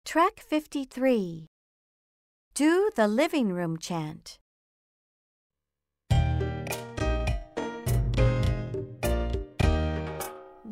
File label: chant slow; the living room